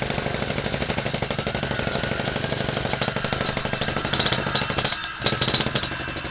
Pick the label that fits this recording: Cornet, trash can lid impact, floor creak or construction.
construction